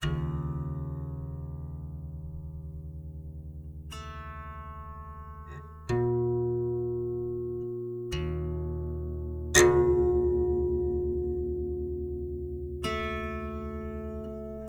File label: desolated strings & wood dissonant string finger pluck; The Soundcatcher; Desolated Strings and Wood